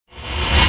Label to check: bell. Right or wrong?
Right